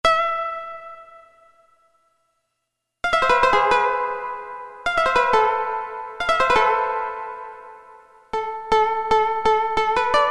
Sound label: Koto; Roland MT-32